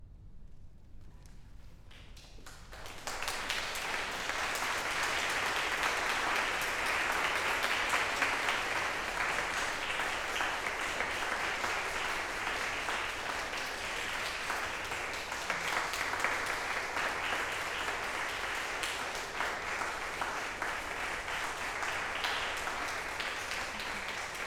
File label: applause